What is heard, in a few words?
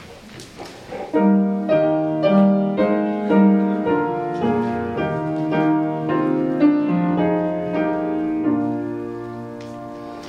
Hymn; In Christ there is no East or West